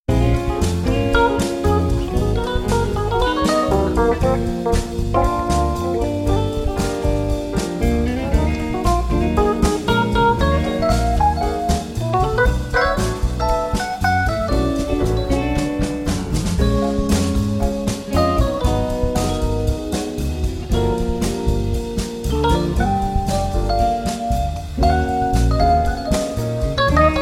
Restaurant Vibraphone Jazz

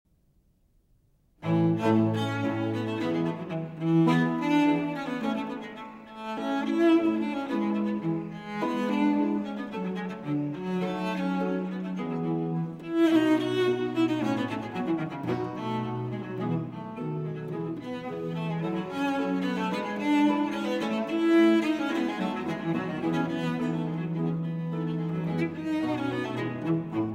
Sonata For Cello and Continuo in B Flat; Vivaldi

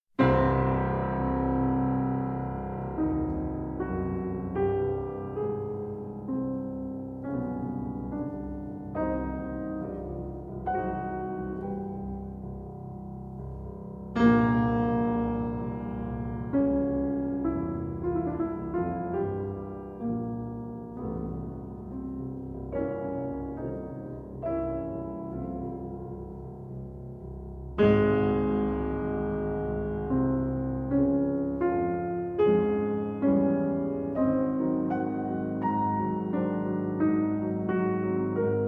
Fantasia in C Minor (Arr. For 2 Pianos) Adagio; Arr. For 2 Pianos By Edvard Grieg

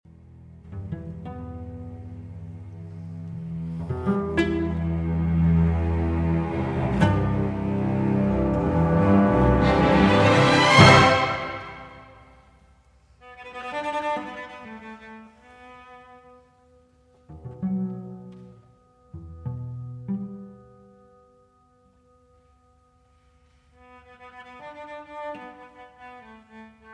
Cello Concerto in E Minor, Lento

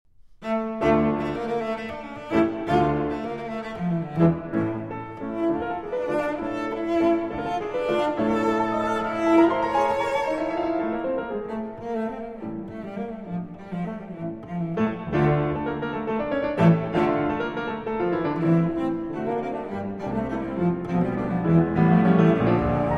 Cello Sonata in G Minor, Scherzo

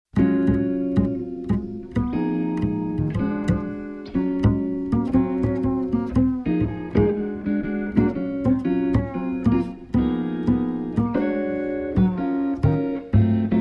Lofi, Jazzy

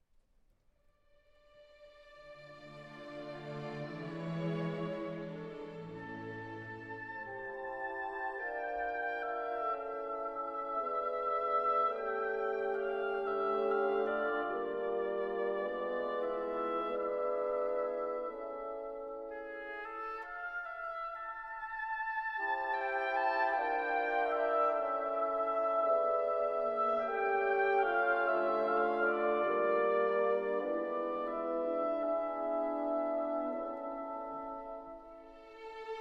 Piano Concerto in D Minor Intermezzo

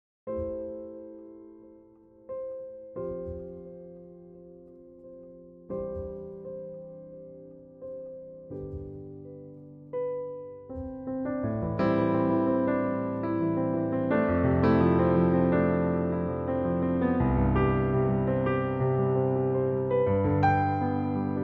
Piano Version

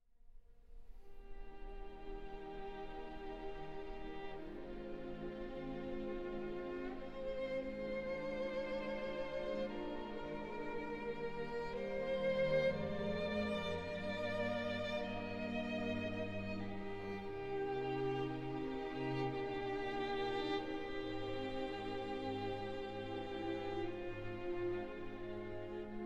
Violin Concerto in G Minor, Adagio

